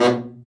BRA BARI, Bariton Sax

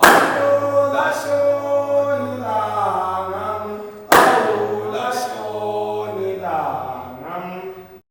MALE CALLS; Spectrasonics; Heart of Africa 2

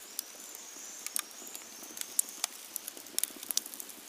Campfire; outdoor; Camping

